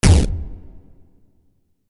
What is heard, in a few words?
Explosion Sound; bloodungeon gamerip